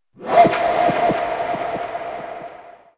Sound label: TECHNO FX 140